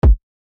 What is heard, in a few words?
Kick